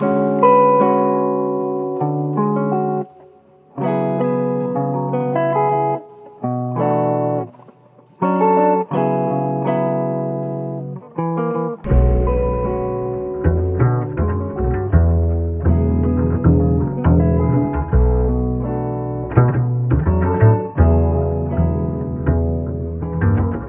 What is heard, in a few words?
guitar